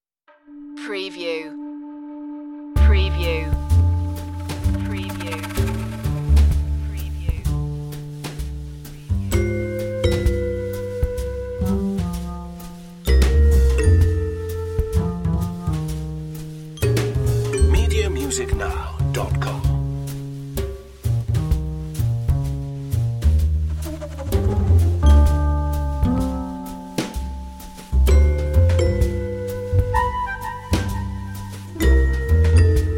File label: life style tv light comedy